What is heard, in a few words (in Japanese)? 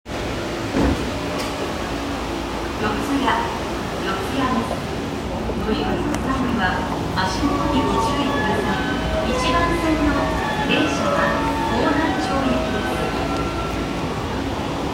丸ノ内線四ツ谷西